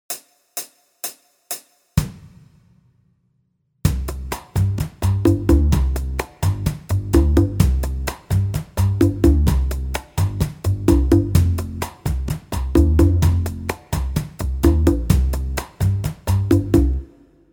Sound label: Jazz backing; Guitar Techniques 2018 Issue 289 December